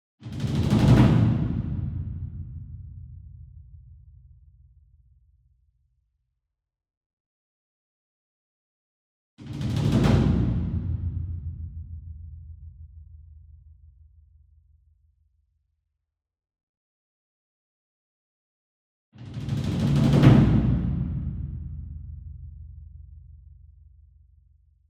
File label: SINGLE DRUM Gong Roll Hard Beater Far, Cinematic Strikes; boom library; Cinematic Strikes Construction Kit